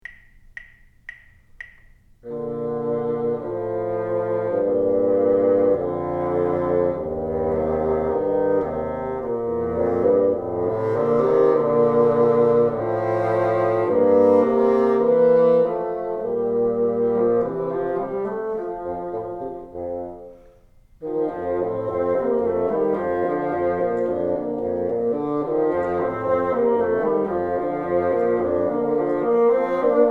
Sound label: together, bassoon